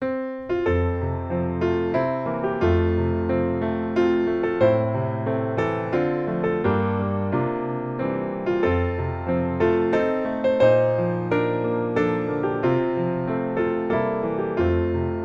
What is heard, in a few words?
piano